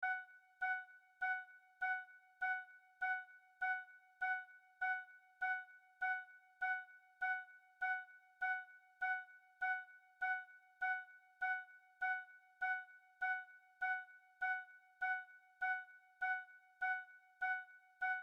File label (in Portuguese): amostra de pulsos com periodicidade